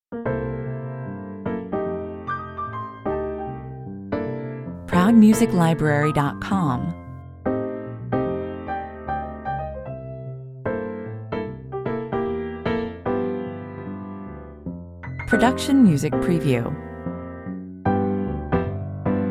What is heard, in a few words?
piano only